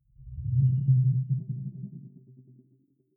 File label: sci-fi display transmission modulated